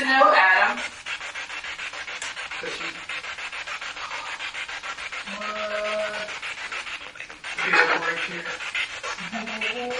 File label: Attic Surgeon Room Spirit Box; madison seminary investigation